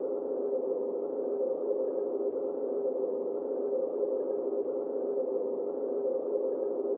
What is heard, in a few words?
reel Spin; mythic maiden